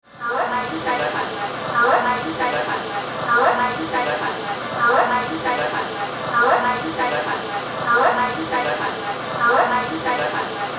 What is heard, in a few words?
Hehehe